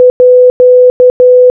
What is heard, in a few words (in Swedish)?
"å", morse